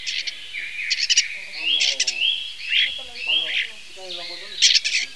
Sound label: Common Starling